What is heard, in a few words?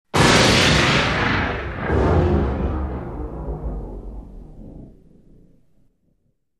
Alien Storm Syntheti, Synthetic Thunder Crack